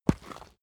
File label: stone stone drop